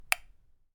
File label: On Switch